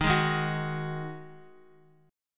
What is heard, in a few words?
Eb Minor Double Flat 5th Eb down Guitar; Ebmbb5 chord; JGuitar